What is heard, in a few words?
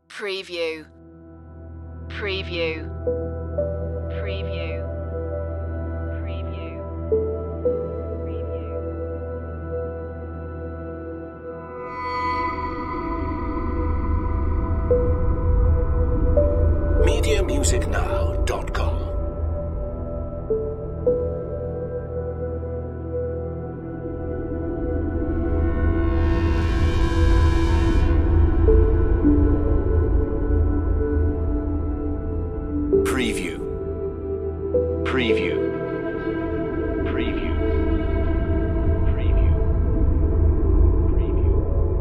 underworld series dramatic cue